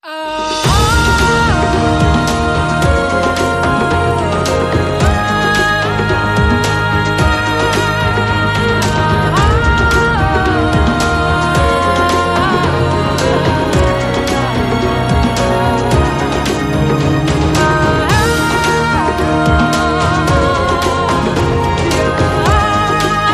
No Percussion Short

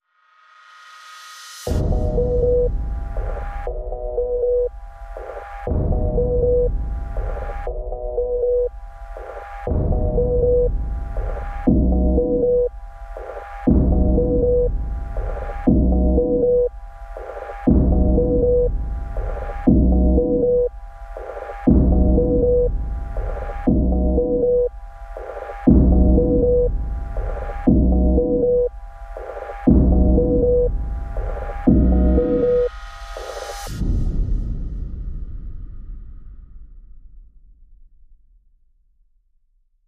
Pulses Only

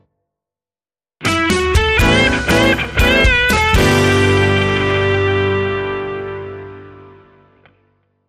70s Rock

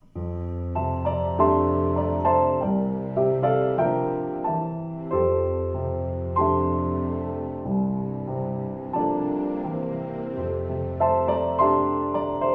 With Strings

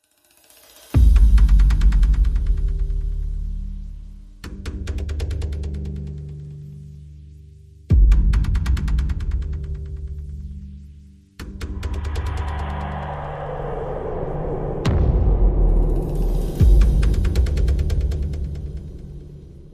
Perc and FX Only

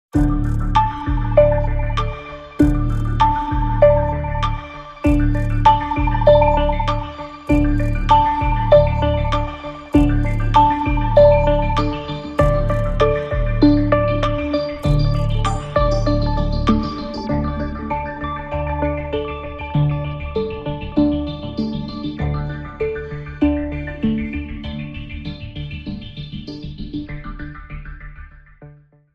Boom And Synth

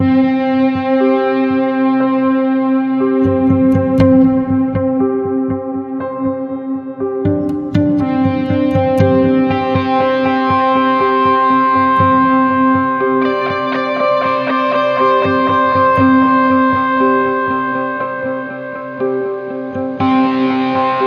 Spark Of Opportunity, No Drums

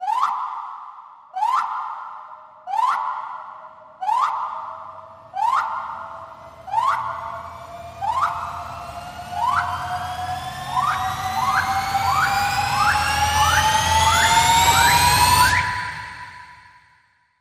Breathless Whistle Pulse Rise

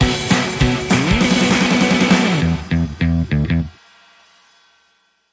Sting

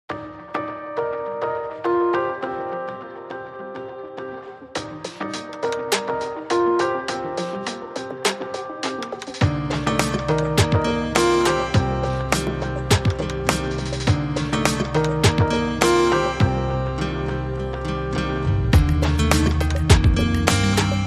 No Glockenspiel

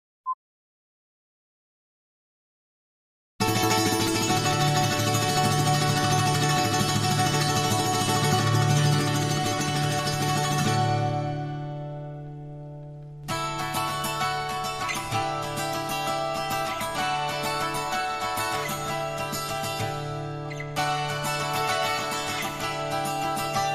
Ocean Sailing, Solo Guitar

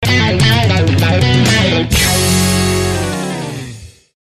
Funk N Groove, Sting

